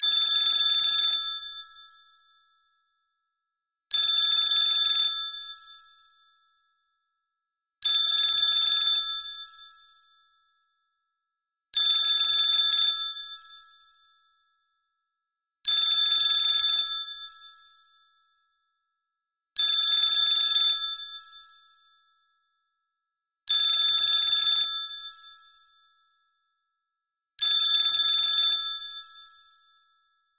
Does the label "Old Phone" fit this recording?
Yes